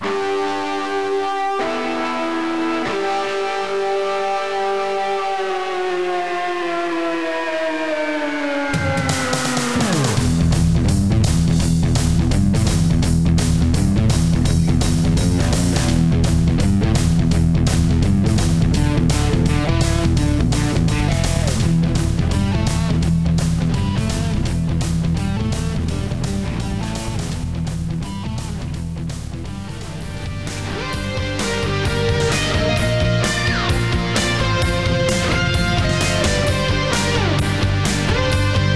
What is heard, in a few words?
rock